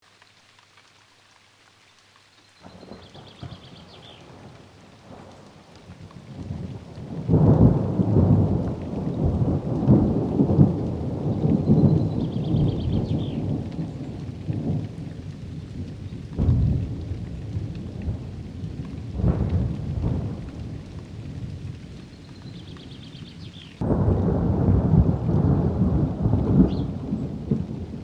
thunderstorm

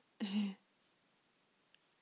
hehe

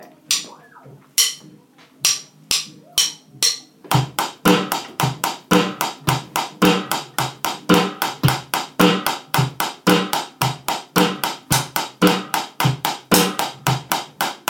live drumbeat performance

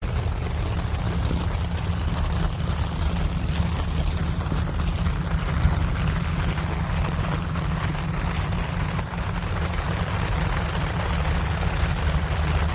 rain on tent roof

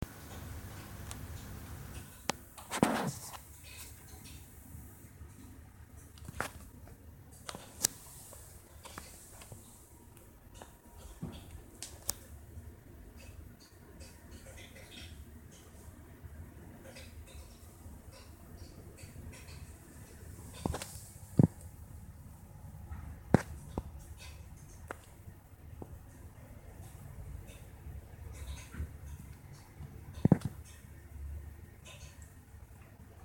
creaky pipes

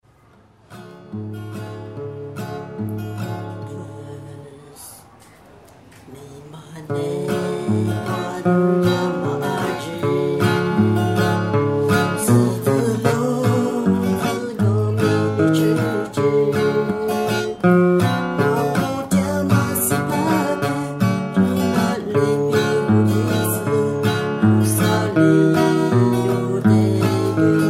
Lisu folk music

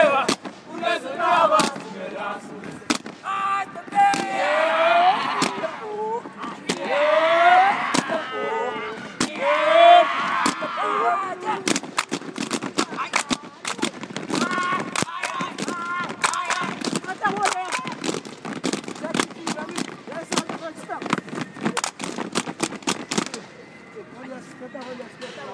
flyover show

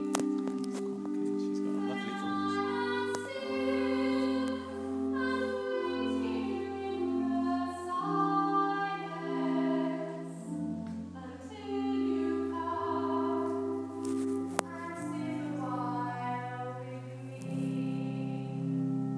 woman singing in church; pulham st mary church

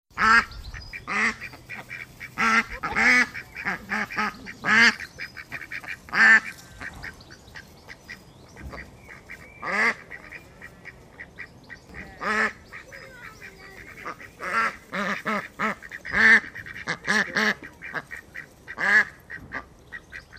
ducks quacking

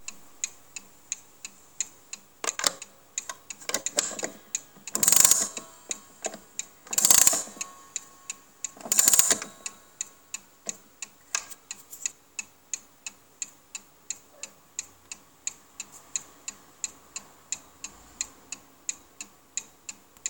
winding a mantle clock

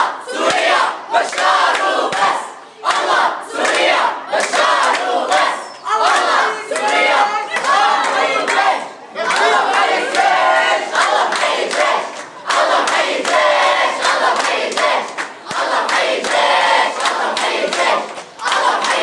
allawites chant support for assad

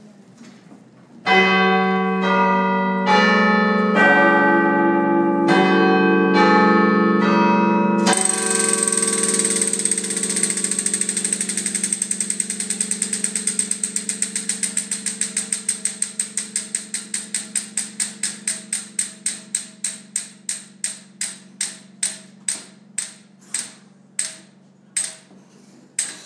manchester town hall clock tower

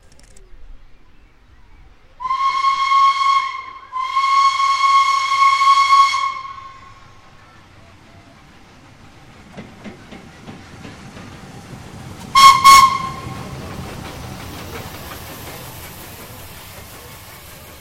train whistle

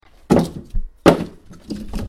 wood

